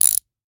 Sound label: Rachet, vanadium steel, 8in, standard, locking, clicking, mechanical, new, socket, rotate, quick; Eiravaein Works; Vaeyan IV